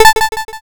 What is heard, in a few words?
toggle on